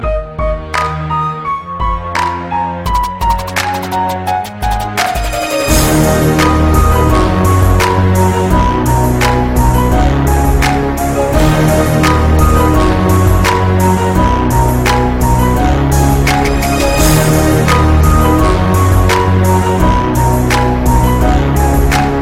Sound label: black beat, hip hop